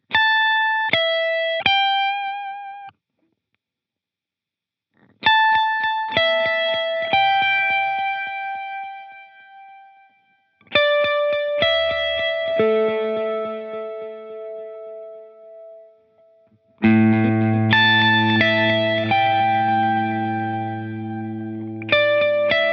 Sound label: Digital Ping Pong